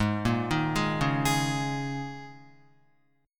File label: G sharp Suspended 2nd Suspended 4th, G sharp, down, Guitar; G#sus2sus4; arpeggiated; JGuitar